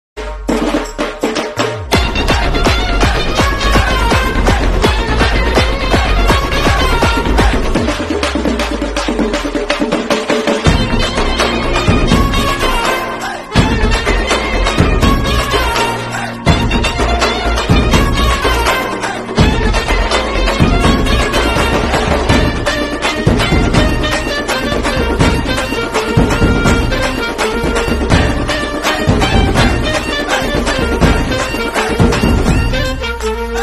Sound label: bgm ringtone